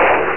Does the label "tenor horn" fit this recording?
No